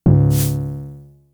FX, Drum Machines; Fricke Schlagzwerg

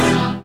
SYN Chord-Voic; Best Service Gigapack 1

